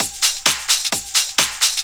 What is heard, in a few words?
drum 130, techno trance drumloops; Techno Trance Essentials